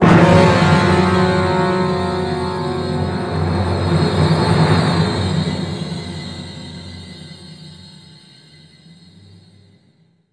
action; Star Wars Bounty Hunter

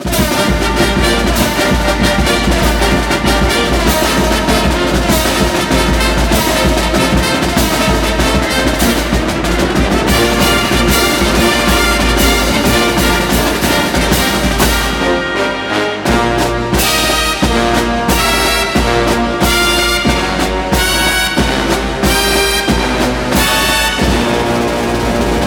Broadcast Fight Song

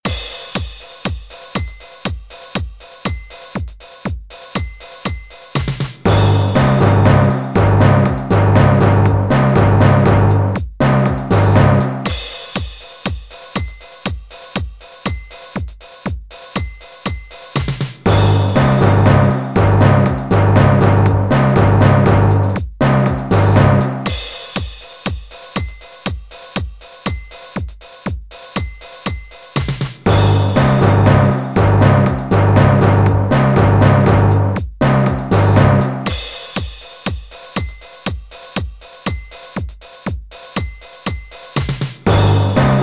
Rhythm Lesson - Timpani 5 (Pair); Rhythm n Notes

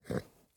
horse grunt; teardown; ps5 windows xbox series xs

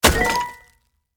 Harvest node Wood

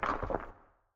Sample Machine Record; Mountain SFX

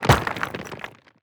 masonry break

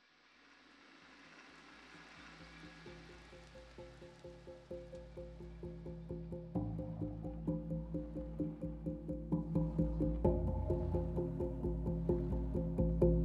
Exploration Tonguedrum; horizon zero dawn

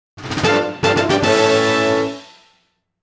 Mini Item Fanfare; legend of zelda the a link between worlds sound selection

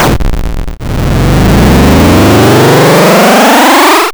Boss Buildup; Spectacular Sparky

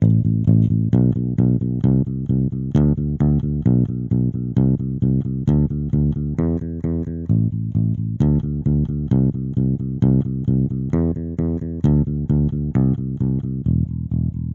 Elec Bass; super crush k.o.

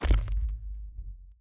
ice land; teardown; ps5 windows xbox series xs

